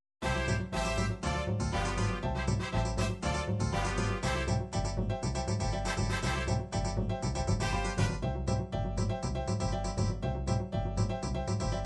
african conga

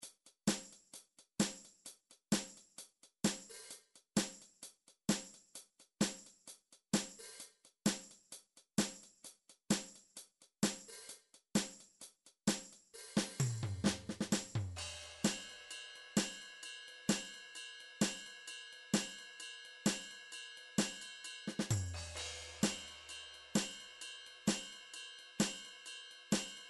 drum midi beat; Drum Midi Files Collection

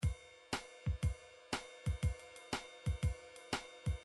midi drums

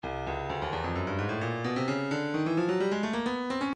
stylus breakbeat midi files 130 bpm

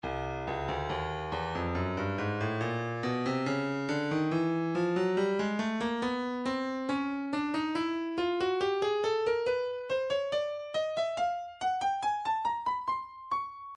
breakbeat 070 bpm; intimate moment a; Stylus Breakbeat Midi Files

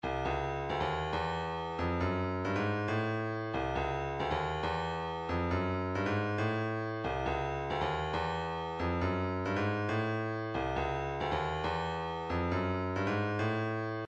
137 kick, midi

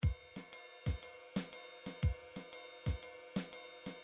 drums, midi drum patterns

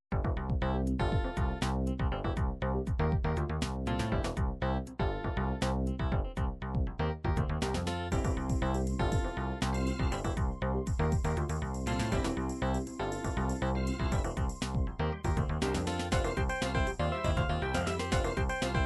dance hip, midi

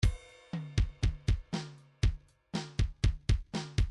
midi file grooves rock

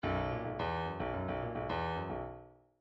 bdf midi file grooves funk c funk c

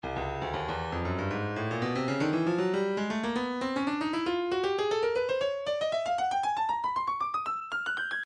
breakbeat midi 117 bpm sizzle; Stylus Breakbeat Midi Files

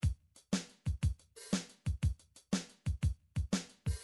midi drum patterns drums

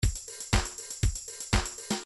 disco 1bar

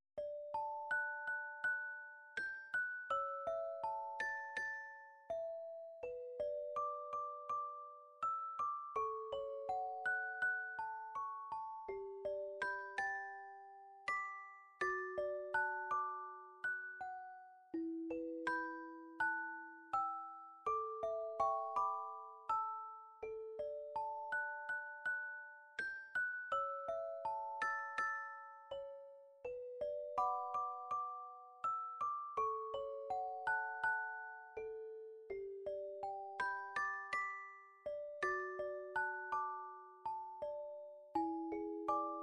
anime chacha

